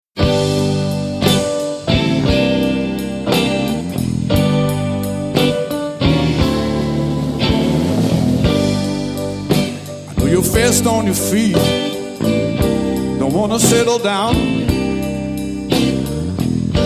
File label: Easy street blues